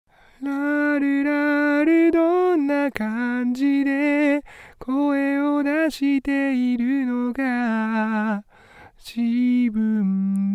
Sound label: vocal